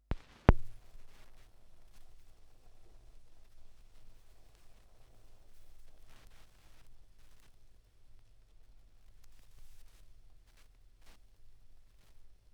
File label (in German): Schallplattengeraeusche, 16rpm; wendelrekords 1.2